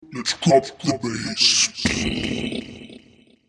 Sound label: lets drop the bass